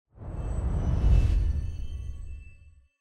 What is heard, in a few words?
whoosh in; prop; spirit blossom